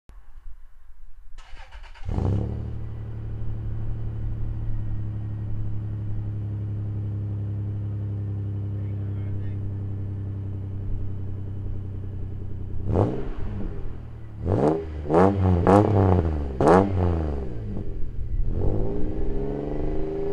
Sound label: lug nut